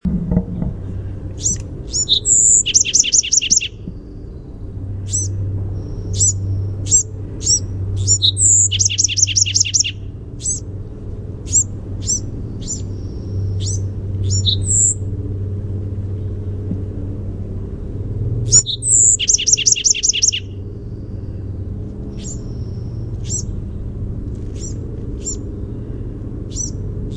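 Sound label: song, Bewick's wren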